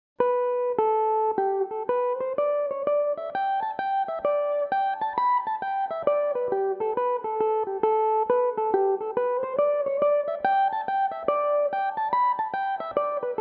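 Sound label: hornpipe G major, Irish mandolin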